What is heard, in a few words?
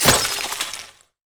ice shard; LoL; lissandra